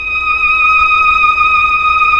STR, Melo. Violins, Tron Violins

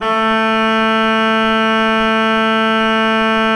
A3, Cathedral Organ, HOLZPRINZ.; Propeller Island

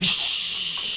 HEY HEY, MALE NOISE, Heart of Africa; Spectrasonics; Heart of Africa 1

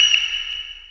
percussion special

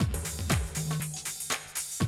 Classic Drum Loops

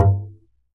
Upright Acoustic Bass, D STRING

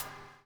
BUBL; Spectrasonics - Backbeat

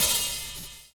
VINYL OH, HH OPEN, Drums; USB Soundscan vol.11 - Drums Dance & Groove; AKAI

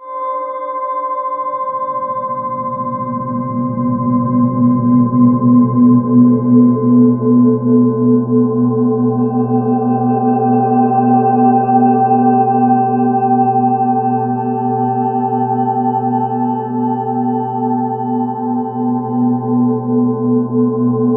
Ominous Drones; USB Soundscan vol.48; AKAI

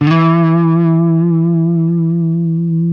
LES PAUL LD E 3; Spectrasonics; Hans Zimmer Guitars VOL-1

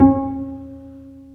Cb Pizzicato; Roland L-CD702; Cb Pizz 1

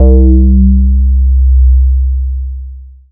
bass C3; Best Service Gigapack 2

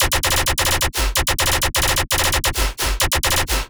stomp bass

